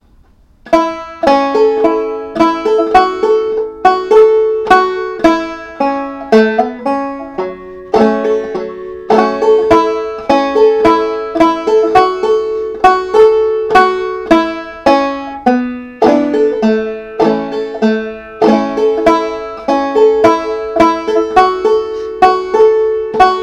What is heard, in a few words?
banjo